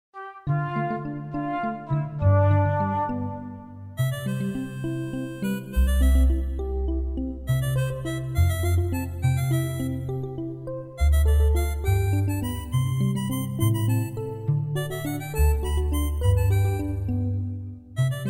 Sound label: Instrumental; Cantica pa move